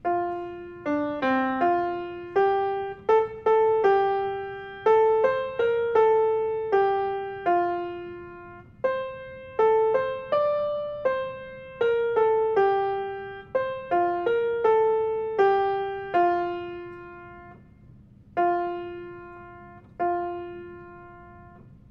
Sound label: Graefenberg MELODY